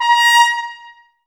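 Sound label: brass trumpets A#; Miroslav Vitous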